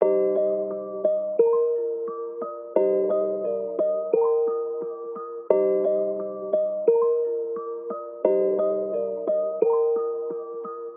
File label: Chill Lofi Bells 175bpm G min